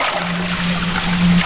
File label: WET, WATER; AKAI S6000 CD-ROM - Volume 1